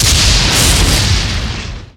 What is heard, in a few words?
lightning spear cast